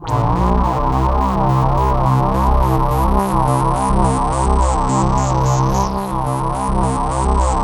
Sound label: C2, SynthLine; 90 s Sample CDs; NiceBeats; SynthLine VOL-2